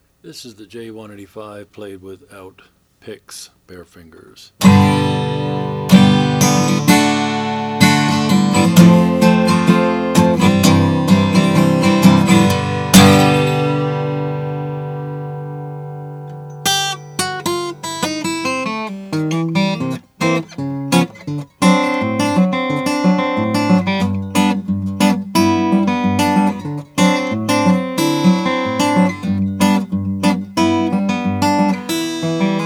gibson j-185 natural

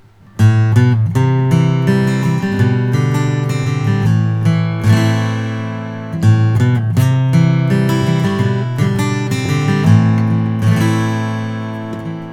froggy bottom h 12 limited koa

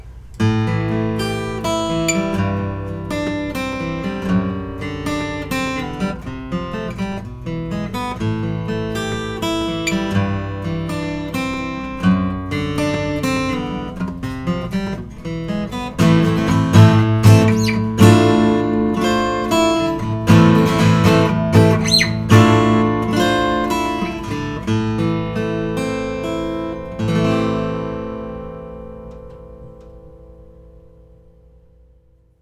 greven 000 12 vintage natural

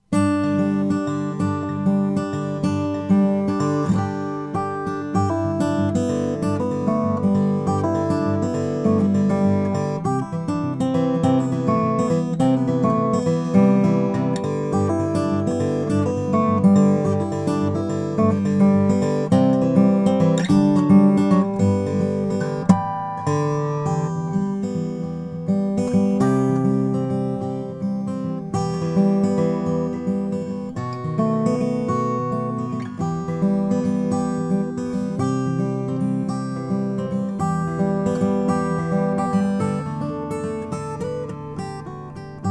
mcpherson mg 3 5 granadillo

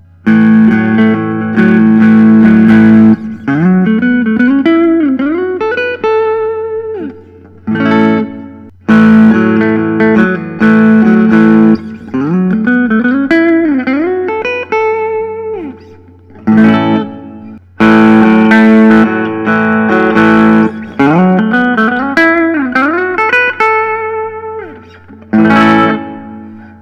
Collings I 35 Deluxe; crimson

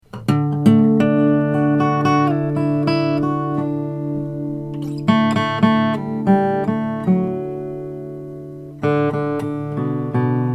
charis acoustic sj cutaway

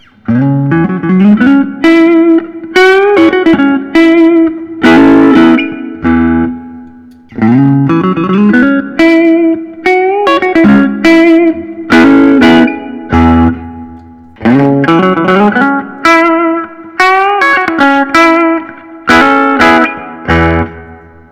gibson custom 59 les paul reissue

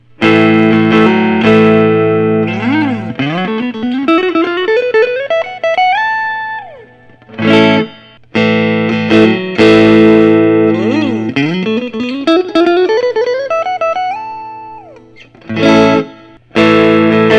suhr classic s quilt top two tone sunburst

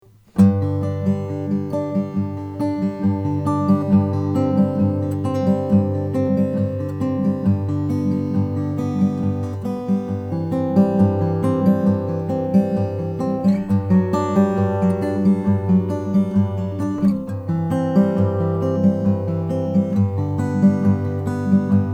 Claxton em cutaway koa

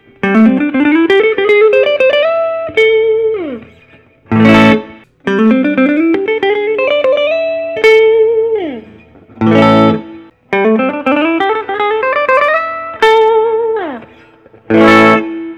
nik huber orca 59; sunburst

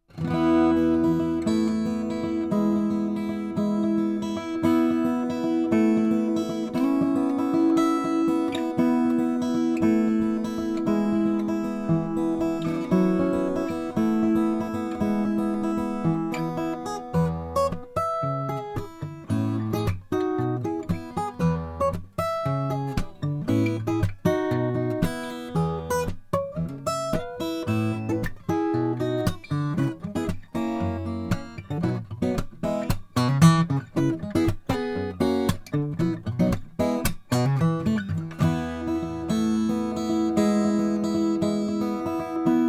goodall special reserve koa

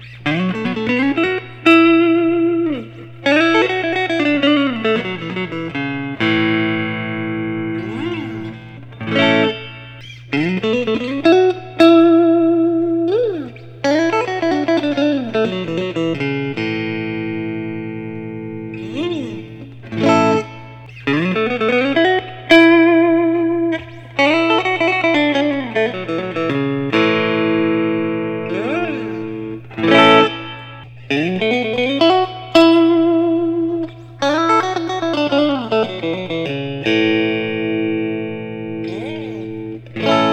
suhr classic s custom

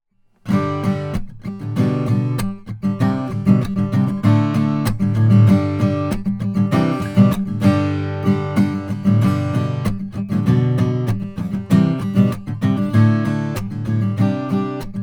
bourgeois omc large sound hole koa

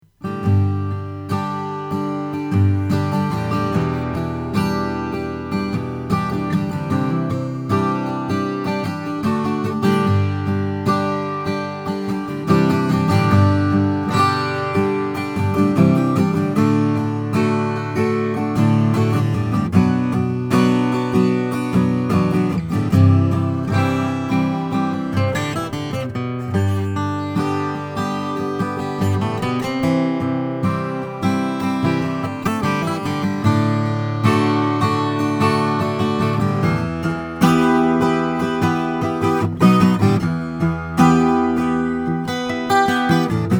taylor fall ltd ed 754 ce

